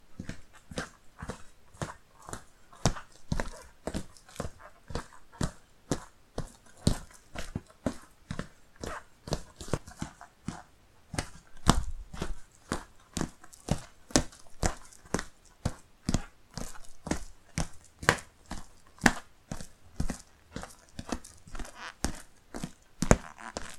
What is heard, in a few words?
Walking On Hard Surface